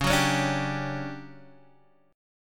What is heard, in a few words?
D Major 11th Db, chord